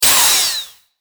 ショット

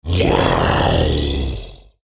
クリーチャーボイス

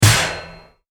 斬撃

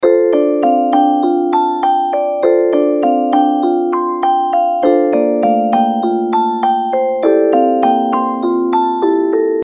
ミステリー オルゴール

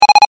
文字送り ループ用

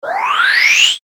上昇

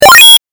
8bit 取得

